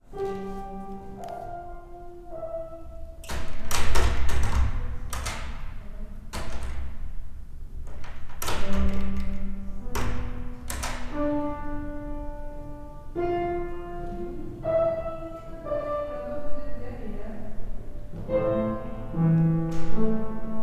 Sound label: piano lesson; gagarin concert hall; sergiyev posad